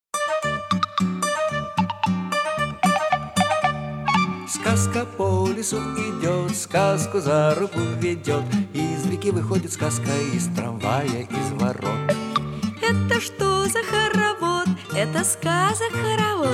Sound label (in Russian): песня сказка; по лесу идет